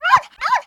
dog bark

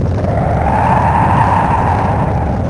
wind

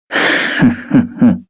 laugh

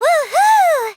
"woohoo"; monophanie

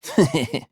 tehehe; leon

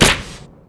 camera snap quick

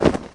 coat

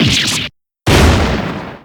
damage

